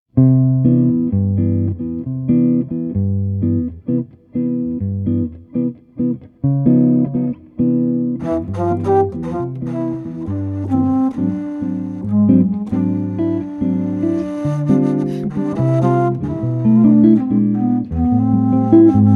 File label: JAZZ À LA FLUTE